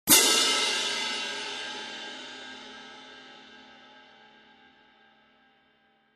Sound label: K Con Med Heavy, cymbal